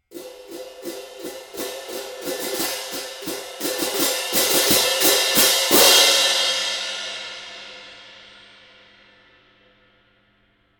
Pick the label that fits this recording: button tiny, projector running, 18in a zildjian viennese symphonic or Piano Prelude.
18in a zildjian viennese symphonic